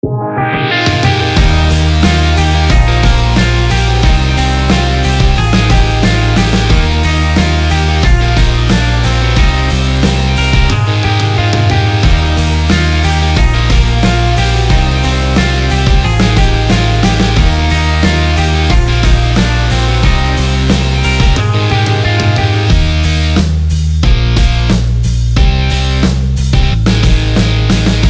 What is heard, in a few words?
pop punk summer